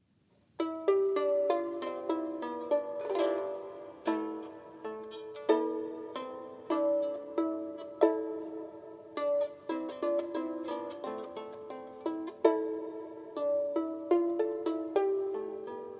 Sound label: Harp; The Ancient Egyptian